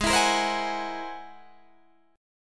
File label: G sharp Augmented 9th G sharp Guitar Open D